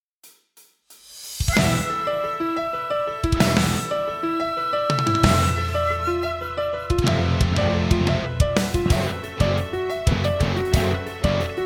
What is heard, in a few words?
backing track